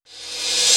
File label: Reverse Cymbal; Vengeance Samples; VEH1 Cymbals